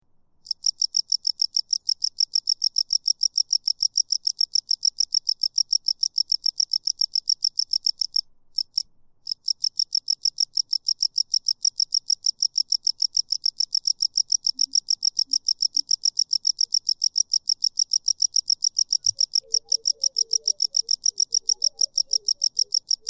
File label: Single Cricket Short: A single cricket, cicada, playing away; Stops occasionally, then continues.; Cricket Sound Effects